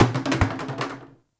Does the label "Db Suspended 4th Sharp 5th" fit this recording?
No